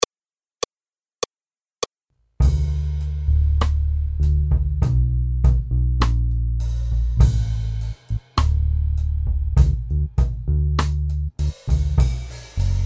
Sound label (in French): Accompagnement seul